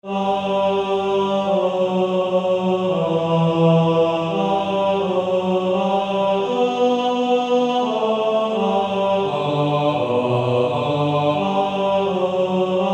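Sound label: Male Choir, second tenor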